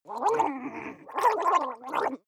water monster female gargle blabber; koerpergeraeusche; comedy-cartoon; soundpool

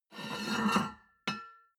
plate on plate take slide soft, restaurant cafe

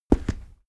step stone step; schritte

